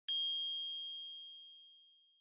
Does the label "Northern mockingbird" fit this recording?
No